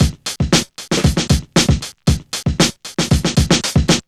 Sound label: FRESH FUNK 116, Funky Elements loops; Zero-G